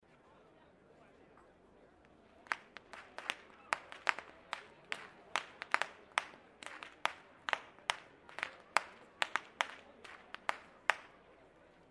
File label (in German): schnalzen, jugend; waging